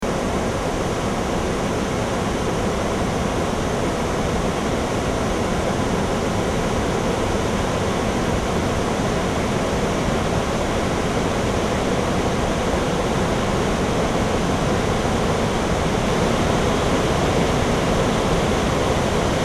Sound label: river sound